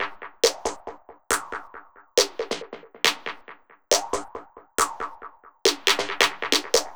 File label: Snare Loop, Drums, Dance Beats